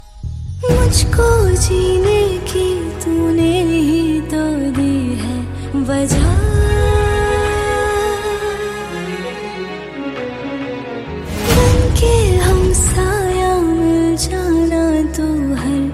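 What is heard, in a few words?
female ringtone, bollywood; bollywood ringtones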